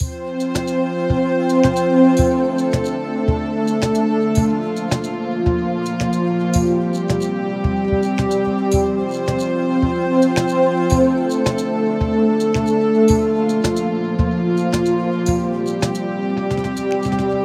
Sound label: menu chill loop music; this is my first creation with Garageband